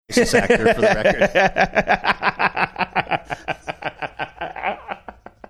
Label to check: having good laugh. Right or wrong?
Right